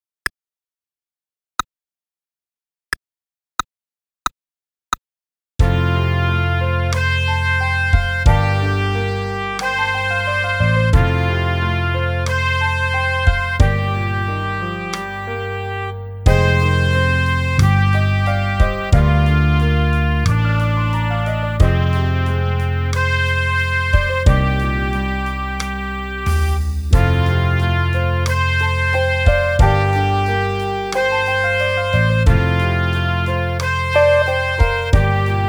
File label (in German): Trumpet Melodie; trumpet fun vol 1